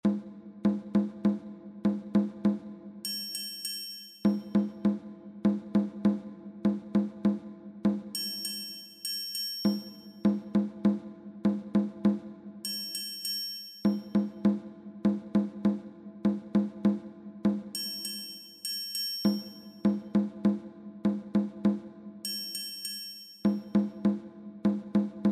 Ritmo; Poema a Venus; Poema latino en versos hexámetros medidos y andados

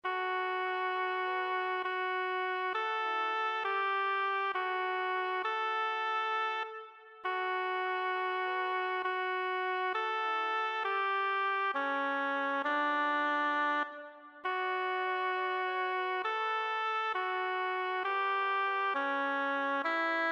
FLAUTA; Con mis manos

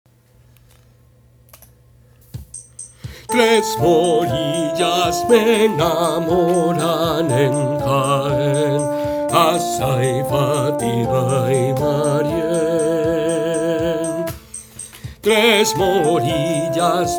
2 VOZ; Tres Morillas

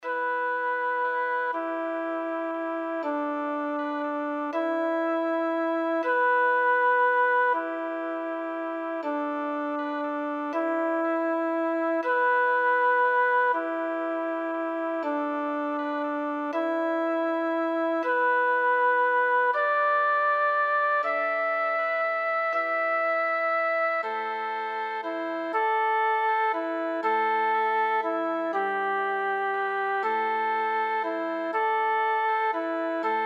FLAUTA; Termópilas

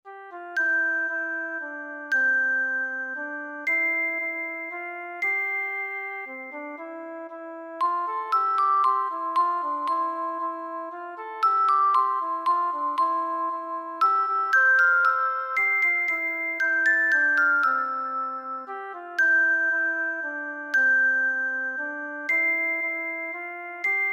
2 VOZ; Alegraos caminad

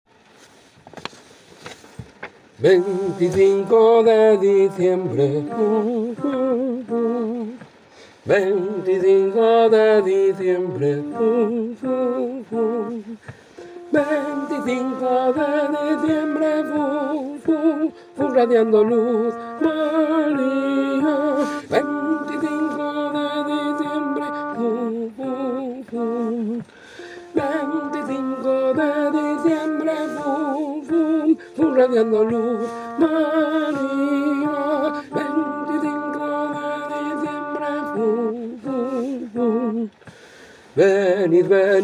Tenor

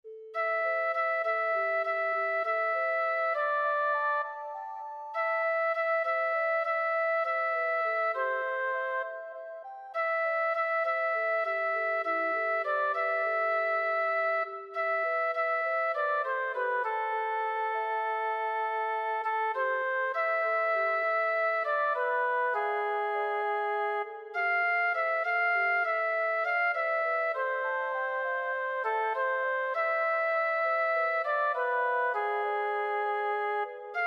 flautas SOPRANO

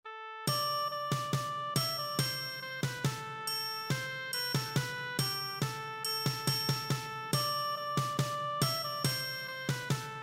TRIANGULO; Hineh ma tov

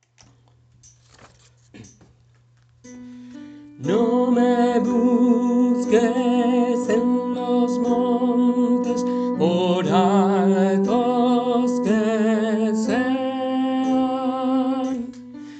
Tenores; Miguel Hernández

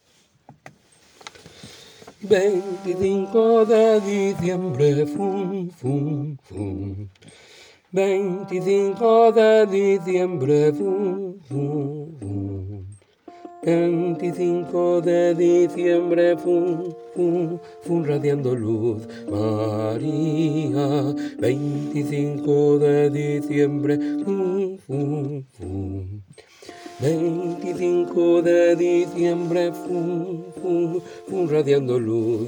Bajo

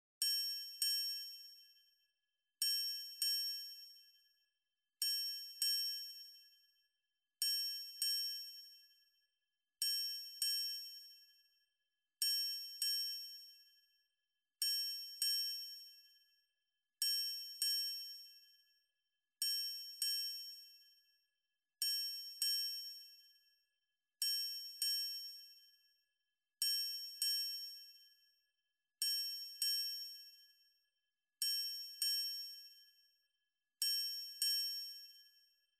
Kirvu Elaj triangulo

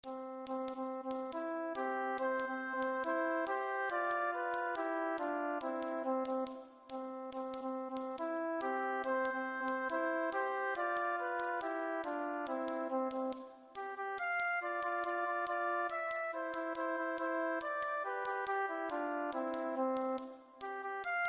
MARACAS; Hojita de guarumal

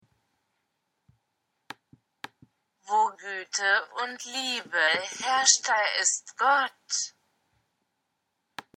Alemán; Ubi caritas

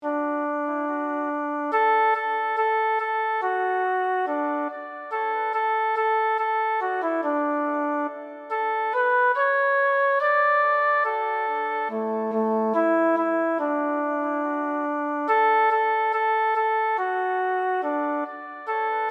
Jubilate Coeli 2 voces

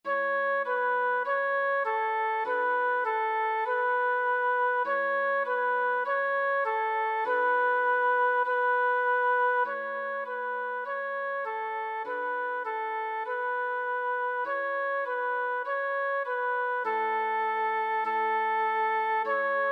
Flauta

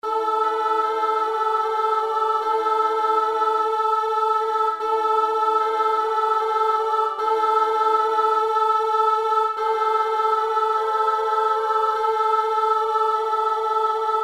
3 VOZ; Valientes

